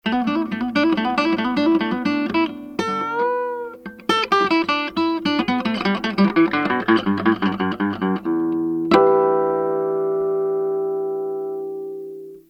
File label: solo, Guitar Pickup, Acoustics